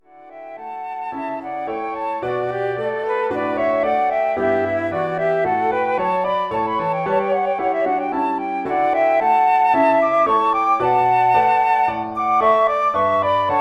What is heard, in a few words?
flute duet